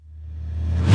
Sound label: REV VerbTomL, Roland JV-2080